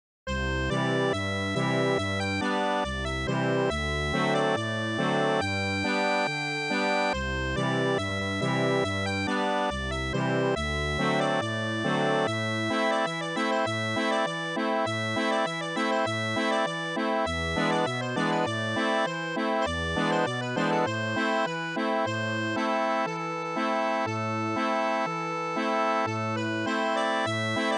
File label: Accordeon